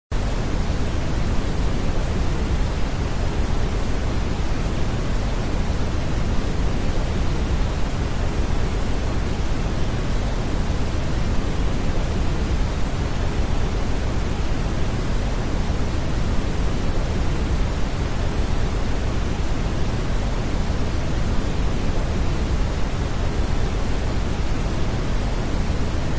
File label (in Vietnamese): Tieng on trang tieng Tivi